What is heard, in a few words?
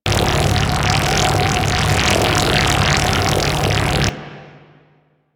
Beam Loop; Enemies; Abilities; Warframe; Corpus; Arachnoid Camper Terra